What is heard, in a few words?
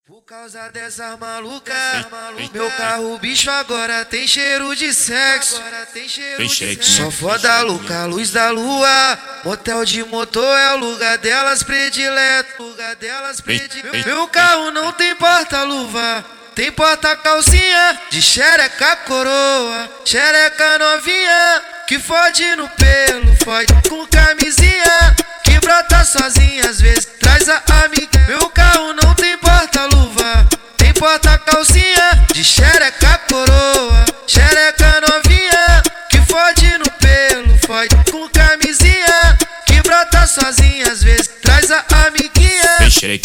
music